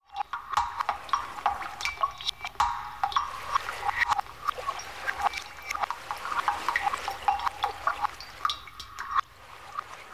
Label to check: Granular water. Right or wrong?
Right